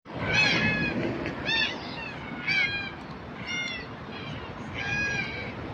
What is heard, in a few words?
cockatoo cry